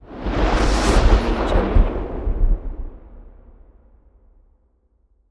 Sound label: cinematic whoosh